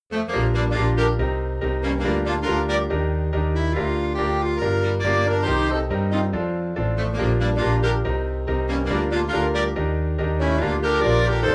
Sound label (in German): Jagdhorn-Swing Thema, clarinet